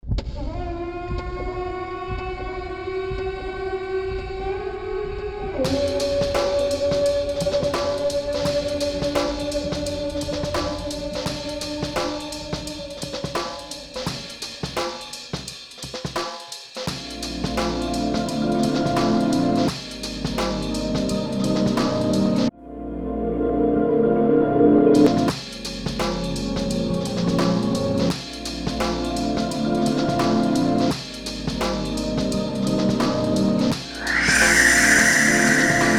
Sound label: halloween creepy, music; project-hood